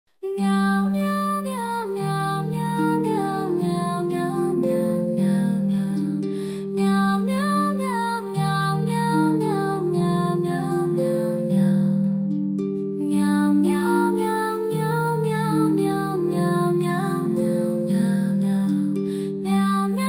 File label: Meow Celtic; MusicBoxAI